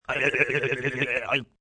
Mouth FX Cartoon, Mouth effects cartoon head shake (human voice)